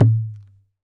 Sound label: Dholak; Roland BK-5; Percussion